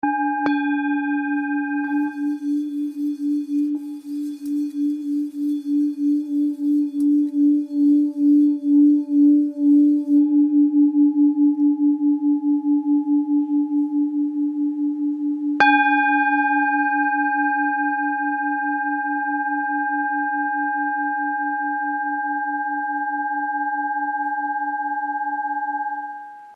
Bol ancien re

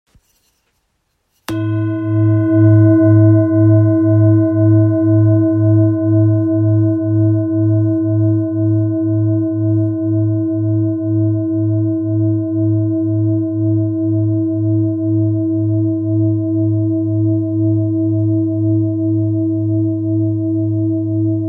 Bol gravure bouddha fa 2.09kg

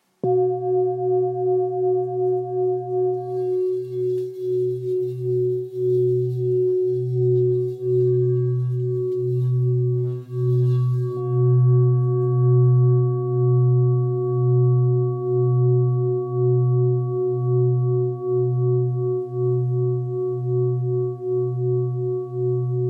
Bol bengale 1.7-1.8kg Si

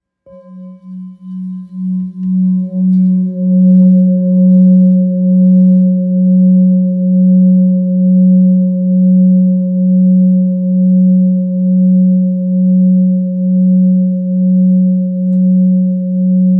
Bol thick fa diese

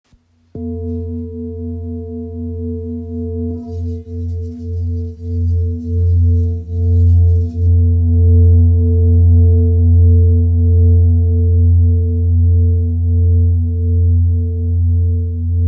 Bol ramgandi la diese

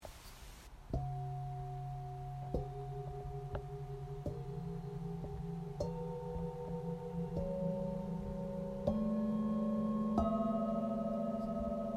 Set 7 bols anciens

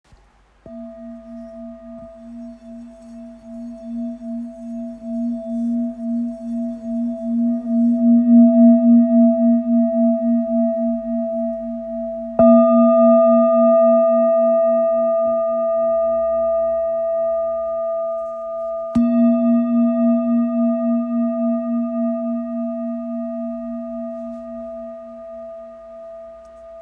Bol bengale mi

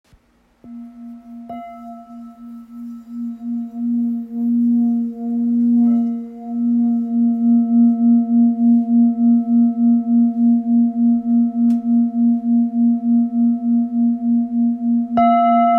Bol bengale la diese

